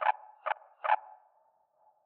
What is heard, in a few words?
tree birds night; Factorio